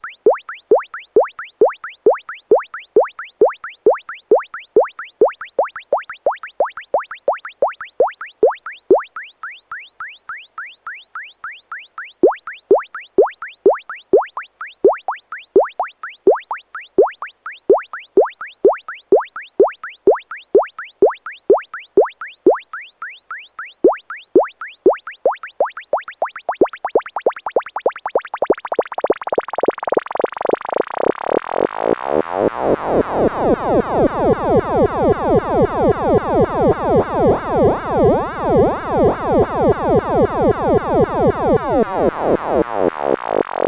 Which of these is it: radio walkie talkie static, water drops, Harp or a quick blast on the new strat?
water drops